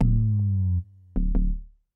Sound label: onda fone bass